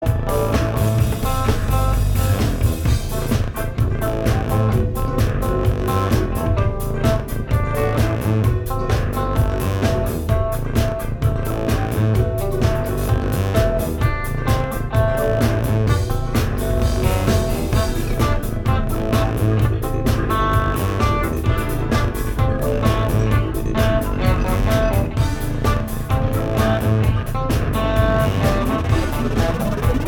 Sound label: Improv Jam